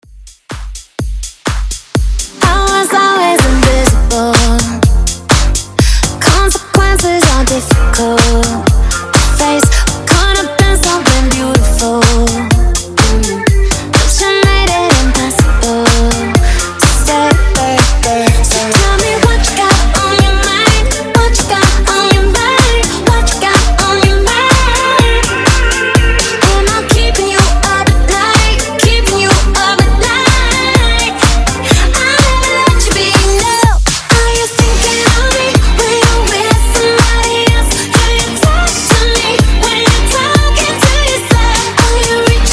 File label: Pop Uptempo 125 BPM